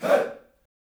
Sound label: Chant; SSO Chants